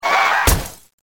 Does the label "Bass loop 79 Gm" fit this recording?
No